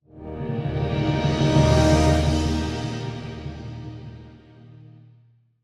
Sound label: Stingers Swell Into Peak and Out 148BPM